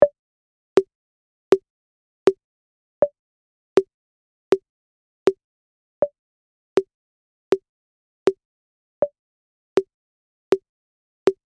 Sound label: Eletronic metronome 80 bpm; gfxsounds